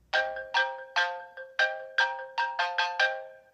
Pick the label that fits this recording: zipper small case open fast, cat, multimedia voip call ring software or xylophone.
xylophone